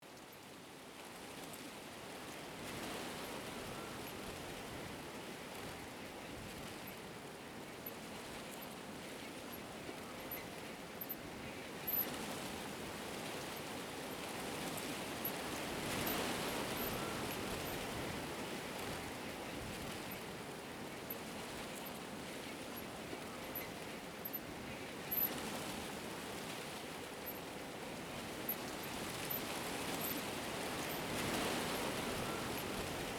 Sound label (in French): Vent